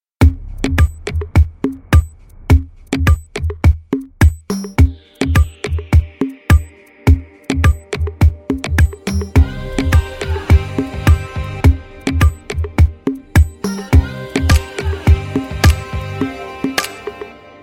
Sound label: Drums